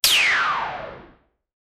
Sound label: shoot blaster; star wars